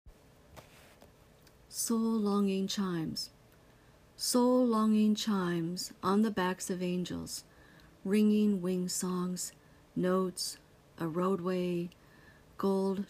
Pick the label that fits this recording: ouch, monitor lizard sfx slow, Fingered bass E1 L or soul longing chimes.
soul longing chimes